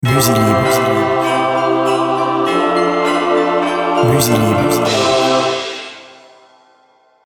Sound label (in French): joyeuses fetes, jingles